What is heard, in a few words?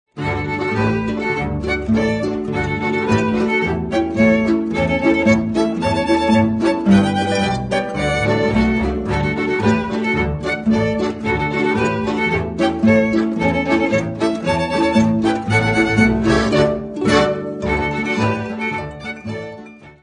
Elba Polka